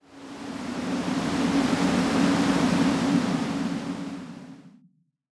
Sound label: ship; fx; PC Gamer IT CD 40; SMACDEMO